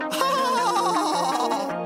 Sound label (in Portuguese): risada; hermes